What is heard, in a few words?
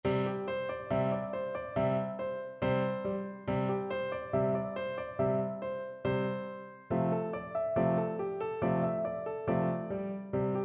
1812, piano